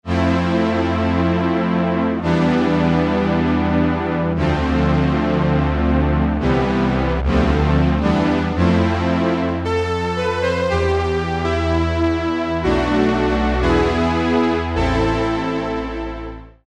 SIN PAD, Ensoniq ESQ-1

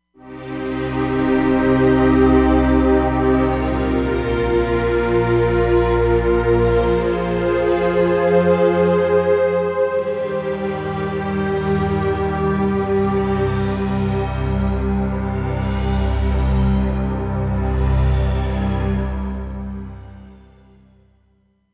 JD Pop Pad, Roland Fantom XR

